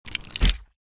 shotgun reload end

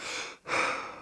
sprint breathe, police

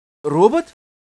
robot

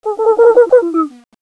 curious; physis

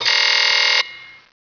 warn

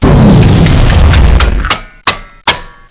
time stop

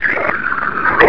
attack

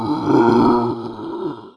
zombie attack

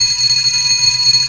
emergency bell

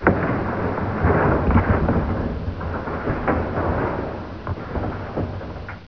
meteor debris

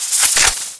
gun draw, weapons

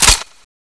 shotgun

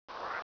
maggot walk

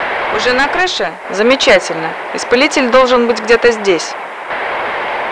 radio

